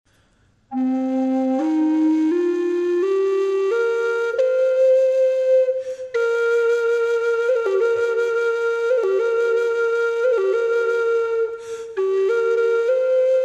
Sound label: Cedar Classic